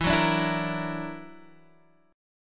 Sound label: Gb Augmented 7th E